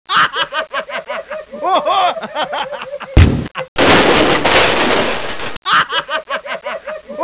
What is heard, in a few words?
rofl